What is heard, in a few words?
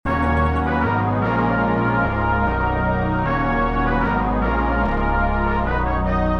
Eb minor 150 BPM